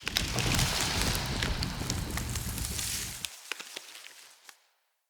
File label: Shrine Portal Activate Vines